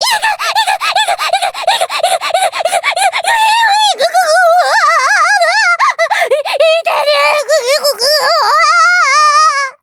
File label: insanity; rik